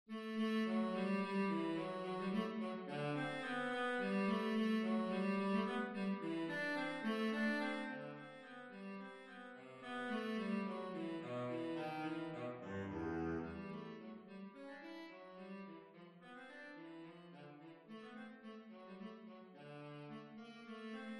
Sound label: Bari Sax Track